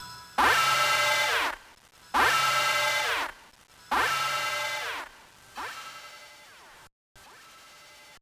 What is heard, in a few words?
Warning; Darius; Game Boy Advance